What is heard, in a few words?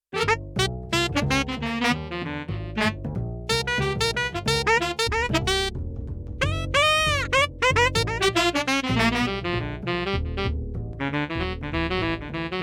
Ac bass Saxsct & solo; Europop Performance 1